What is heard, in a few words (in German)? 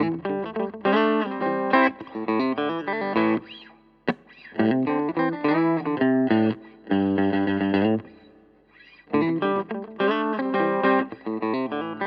Maybach Harley Benton Vergleich Maybach Clean